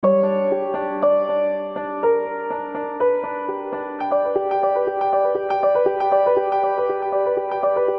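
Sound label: Jaunty Piano, G Major 120 bpm; MIDI Clips; Tonal; Motifs; Ableton; Factory Packs; Mood Reel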